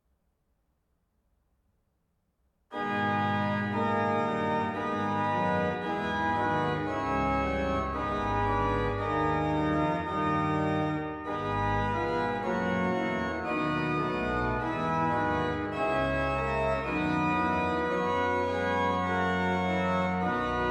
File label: Choral; BWV 768; Freiberg; Sei gegruesset; J.S. Bach – BWV 768 – Partita en sol mineur: Sei Gegrüsset, Jesu Gutig